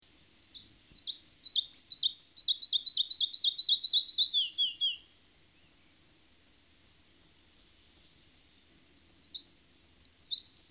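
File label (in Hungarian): Kis légykapó, Madarak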